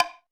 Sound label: Hollow, Alesis D4